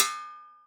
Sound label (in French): casserole; In the kitchen; 96khz 24b mono